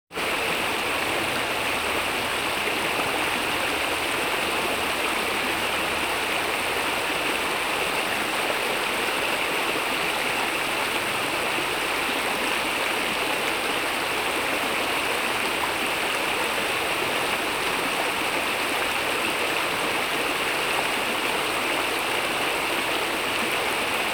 Snelstromende Rivier, watersport-natuur; KMP Film Video Sound Effects

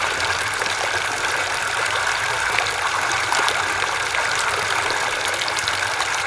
fontein

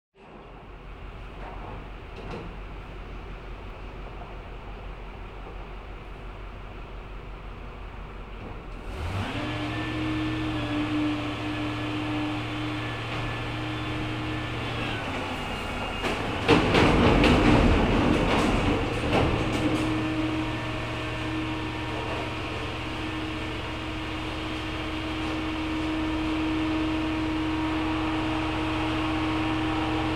Vuilniswagen Aan het werk; KMP Film Video Sound Effects

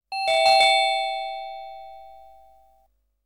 Deurbel; KMP Film Video Sound Effects

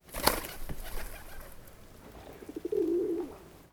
duif

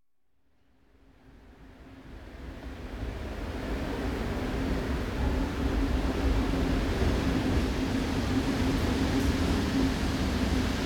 Station Groot Amsterdam; KMP Film Video Sound Effects

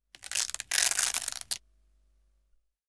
Klittenband; KMP Film Video Sound Effects

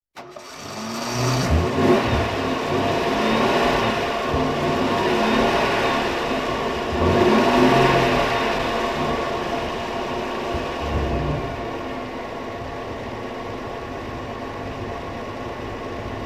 Auto Start; KMP Film Video Sound Effects

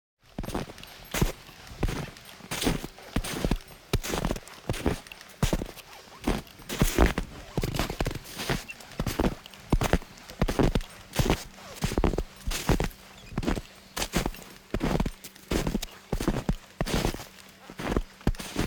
Skieer Loopt Door De Sneeuw; KMP Film Video Sound Effects

